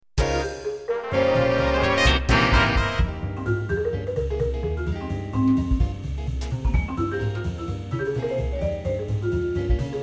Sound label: big band